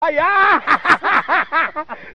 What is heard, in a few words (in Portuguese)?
risada